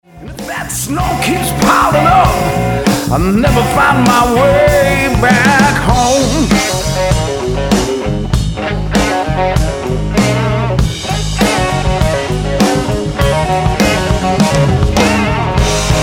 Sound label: Wisconsin Blues